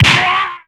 SMAK WAHHH, percussive

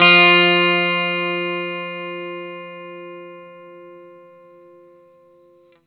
NOTE F A, Vintage Blues Guitar; AKAI; USB Soundscan vol.22

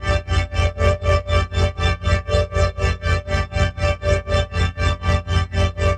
Sonic Orchs, Ambience; Sonic Foundry (Sony Creative Software); Numina II

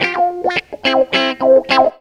GTR, Funky Elements GTR RIFFS; Zero-G

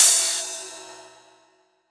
Rides, Cymbals; Vengeance; Essential Clubsounds Vol.4

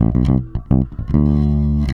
REAL BASS, BASSLICKS; Ueberschall; Jam Box

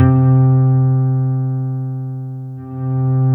C3, SynthLine; 90 s Sample CDs; NiceBeats; SynthLine VOL-33

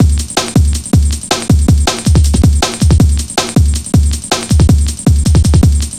160, Drum Loops; Total Drum&Bass

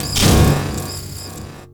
Metal; Sonic Foundry (Sony Creative Software); Esoterik Beatz; Mecan FX

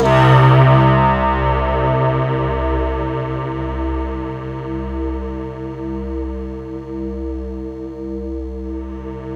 C3, SynthLine

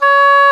WND D OBOE, Oboe D'amore; Roland L-CDX-03 Disk 1; Oboe 4-8

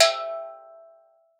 steeldrum E4; Xsample VOL-4

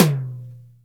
TOM CROSS, Real Toms, Dry Toms; Roland L-CD701

